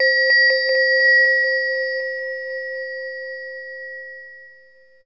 SYNTH BELL; AMG - Vince Clarke Lucky Bastard

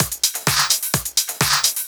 Clubhouse Loop 128 BPM

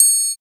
TRIANGL; East West - Phat & Phunky

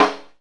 SNARE RIM, VINTAGE FUNK; Zero-G; Funky Elements

